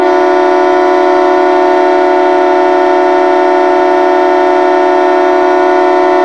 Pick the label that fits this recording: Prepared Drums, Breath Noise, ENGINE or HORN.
HORN